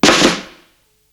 snare roll, Psychedelic Drums

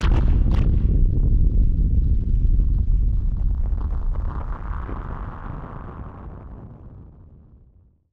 Firework FX; musicradar; bomb factory

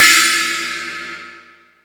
More Cymbals, Drum Machines E-MU Pro-Cussion

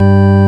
ORG VOX G, Continental; Roland LCDP09 Keys of the 60s and 70s 1

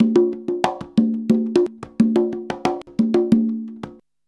CONGA 119

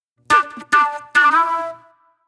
Agro Fusion Flute; Sonic Foundry (Sony Creative Software); Manhattan Flutes; Garage Funk

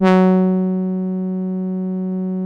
700 TROM F#2, Korg; Back In Time Records; Korg Universe VOL-1

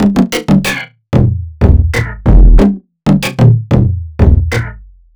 Dirt Dry, Drum; Sonic Foundry (Sony Creative Software) - Future Retro Dance Excursions

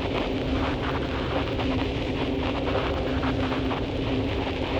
Cracklature, sparse soundscape

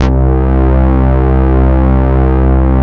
SYN, synthesizer bass; VFX-SD

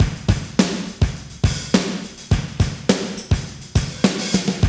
Loop, Roland; Sound & Vision - Gigapack I

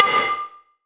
Perc, Gated Reverb; creative gate; musicradar

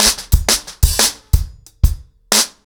90BPM, acoustic drums, drumloops

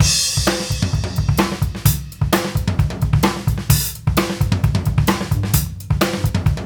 groove, Grooves 99-132bpm

